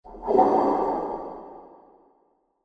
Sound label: Some Haunted Motion, ghost sound effect; Andrew Fleming; Twistedtracks